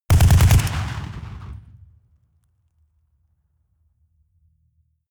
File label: rifle ak-47 exterior fire burst bass; schusswaffen; Firearms